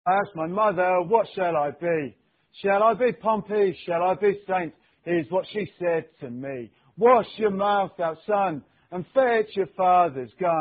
Singing When I Was Just A Little Boy, Football Chant (Soccer Song); Francis Benali